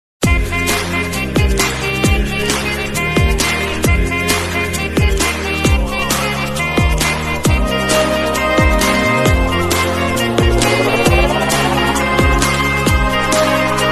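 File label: Marriage background music ringtone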